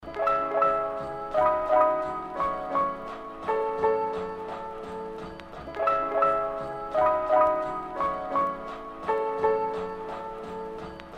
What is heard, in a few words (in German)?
Sms Klavier